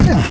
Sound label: bump, male